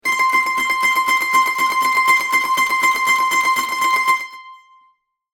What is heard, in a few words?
Dulcimer high pitch tremolo long